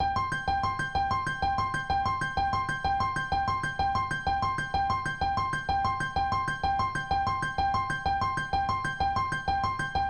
Descant Dance Piano; super crush k.o.

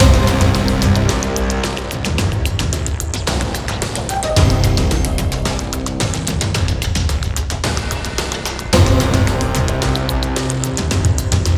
Dungeon World Main LOOP

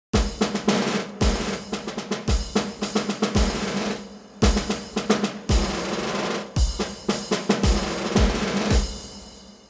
Music Main Menu; Army Rescue; Wii

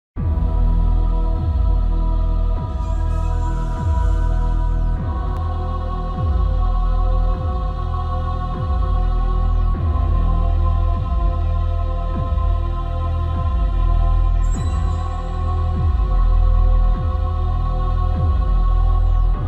Exploration; Dark Insect Nest; metal slug awakening code j soundtrack; android

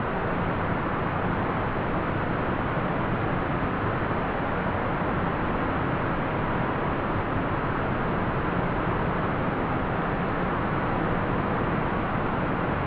ambience desert

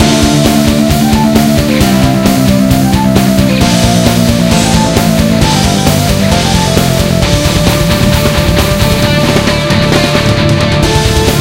Dungeon; Onigo Hunter

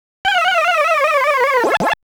Fail; super pac man

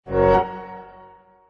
level Up; Yet Another Pixel Dungeon; Android

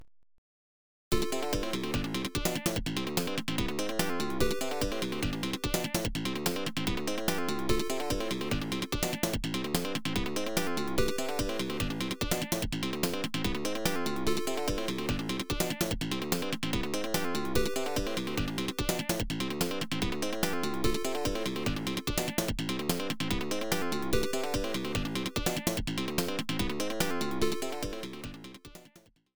Risky Escape; Kurayami; PC-98

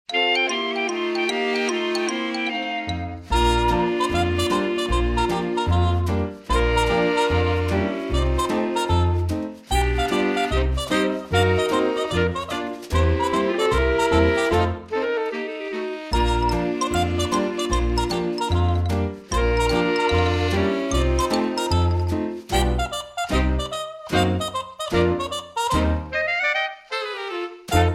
Instrumental; Nick Jr Radio

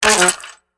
Ui Not Enough Currency

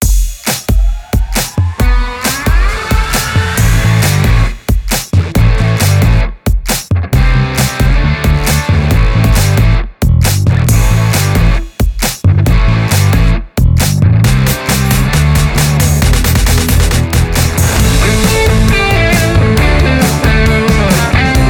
Multiballs LOOP; Trolls

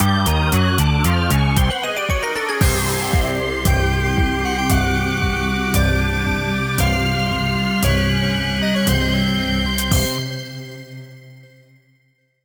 Game Over

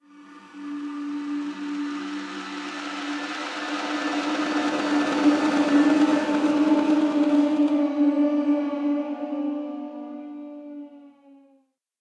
Combat Boss; singularity; ps3; xbox 360

